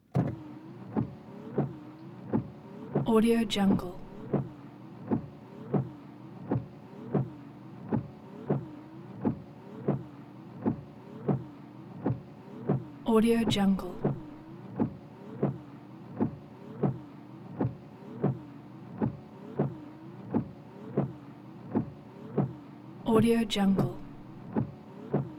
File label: car windshield wipers